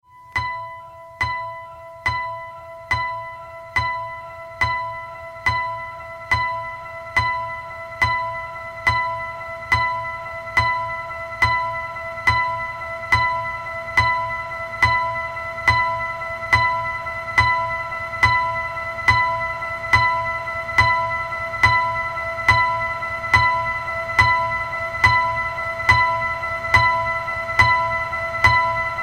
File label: piano alarm